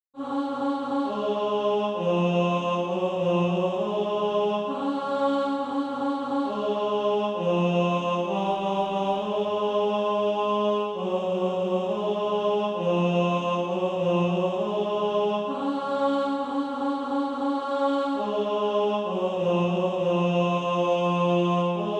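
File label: tenor, SATB Practice Music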